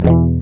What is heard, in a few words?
GUI Acoustic